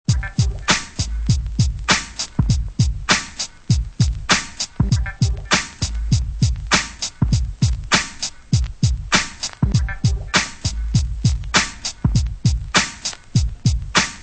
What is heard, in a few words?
drum loops